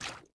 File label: fs water, footsteps